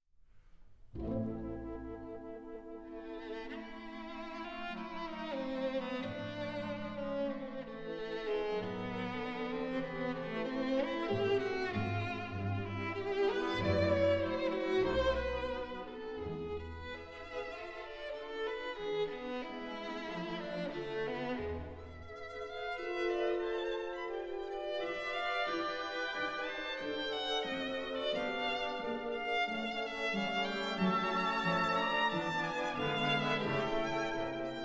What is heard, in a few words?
Violin Concerto Op 82 Moderato; Glazunov